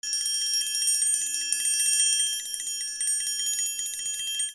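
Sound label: percussion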